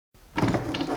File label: wooden Crate Open